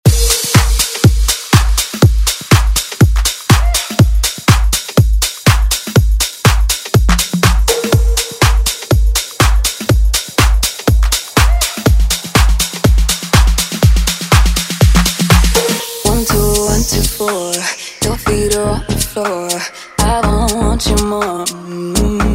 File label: Dance